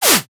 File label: whip whoosh